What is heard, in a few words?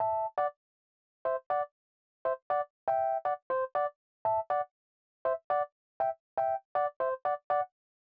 120 Rhodes A, Keys, Nu Jazz House; PowerFX